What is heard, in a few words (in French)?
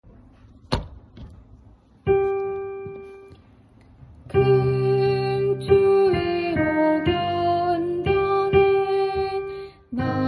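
fin soprano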